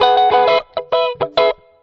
guitar hawaiian, Loops, Phonky

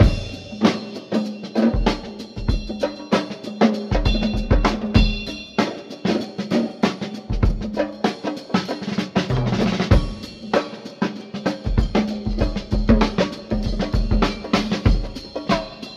sudden organ shift, drum loops, funky

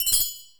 jingle bells

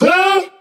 huh, Voice

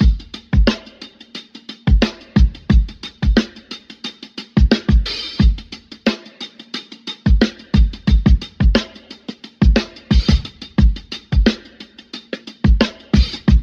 Drum loops, Boombap

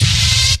elephantile, Stabs

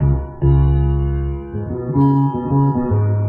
piano bassed out, Loops; Phonky

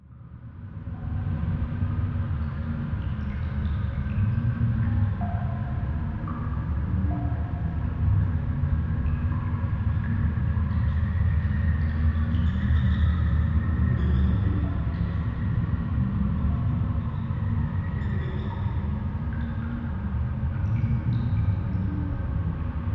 caving, Rumbles

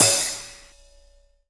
cymbals electro

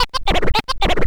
radar, Vinyl; Misc Percussion

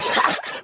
ha wheeze; Voice; ooh ahh

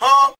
huh male, Voice

